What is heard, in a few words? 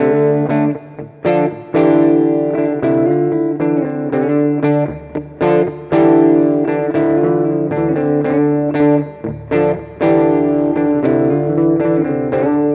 Clean Boogie; Torpedo CAB M